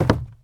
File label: zombie footstep wood; DeadFright